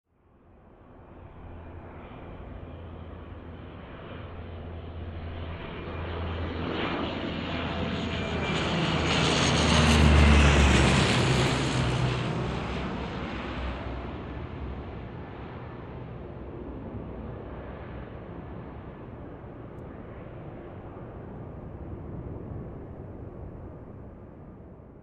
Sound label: Aircraft Soaring whirring sound distant